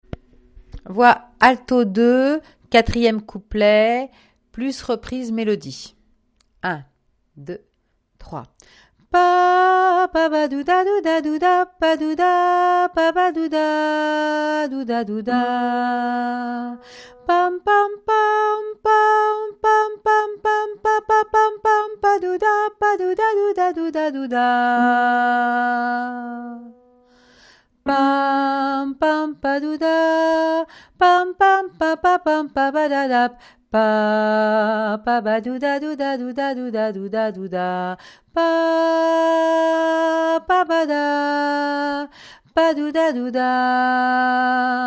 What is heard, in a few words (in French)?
alto; Le Bolero